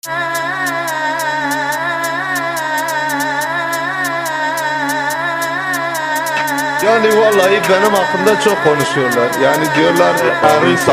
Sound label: Drill Remix; Tiktok